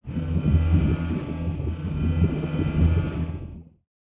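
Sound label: Grave Fidget; Sid Meier's Civilization 4 Complete; Beyond the Sword; Units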